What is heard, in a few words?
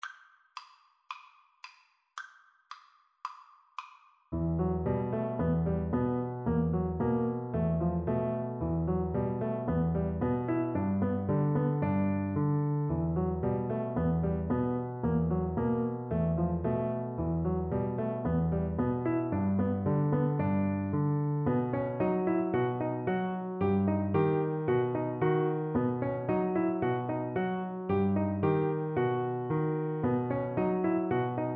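grieg mountain king, xylophone; Percussion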